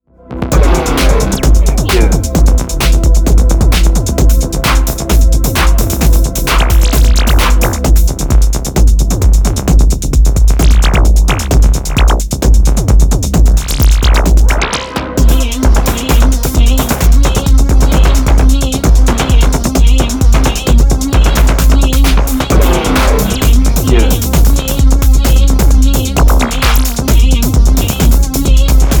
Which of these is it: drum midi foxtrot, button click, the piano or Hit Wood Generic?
the piano